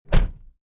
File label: Transportation Automobiles, Car Interior, 1996 Acura Integra, Door Close, Light, No Engine